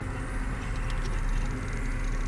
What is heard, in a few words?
reelSpin; codex of fortune